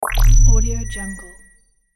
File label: holo accepted